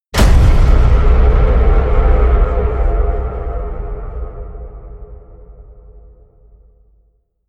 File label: hit big antiparticle; brand x music